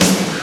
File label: clean acoustic snare sound f sharp key; Mono